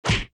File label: hitsound; gamerip; Arc of Templar